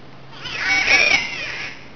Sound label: a bird; tropics